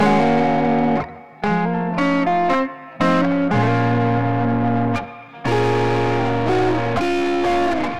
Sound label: guitar, sd-1 style parallel overdrive; eeldrive; codwaves